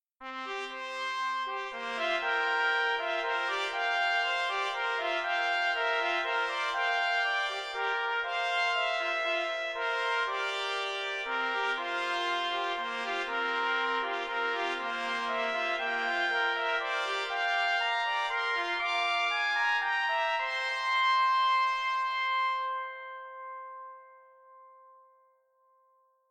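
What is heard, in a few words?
Fanfare 2 for 2 Trumpets, Brass Fanfares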